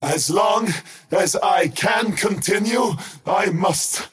skywrath crown move pain; skywrath mage

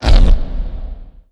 whoopee cushion cast; weapons; dota; ringmaster

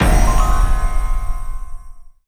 treasure reveal; ui; inventory

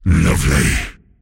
last hit pain; pudge

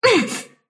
sylph pain; dota; dark willow

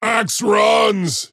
move pain; dota; axe

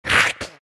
snarl; Undying

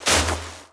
tag team target; tusk

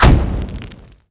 searing impact; weapons; dota; clinkz

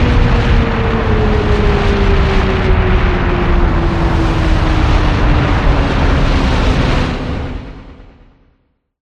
solar bind effect; keeper

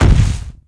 walk, footsteps; earthshaker

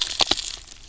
foley, footsteps; physics; undying

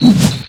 preattack; spirit breaker

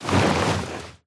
wings; gore magala; mh event

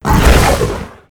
attack; dota; jakiro

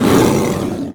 death dragonspawn sorcerer, creep deaths; dota

